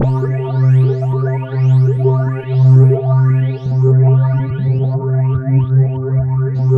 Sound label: BLIPPING, OBI FX; Zero-G Ambient 1